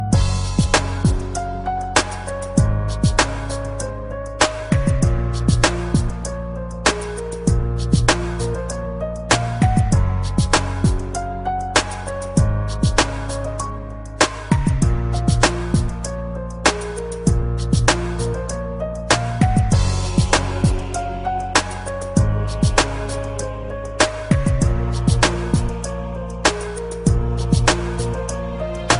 Love Beat

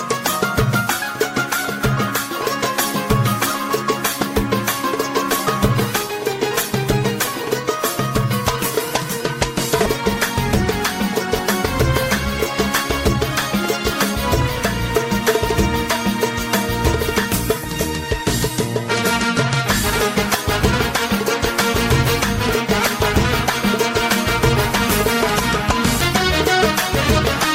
Instrumental Rai

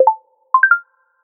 Sms

